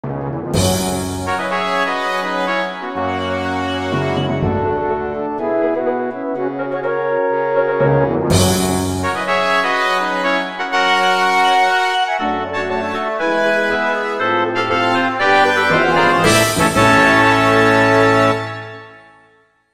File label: fanfare